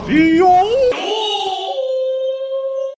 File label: yoooouh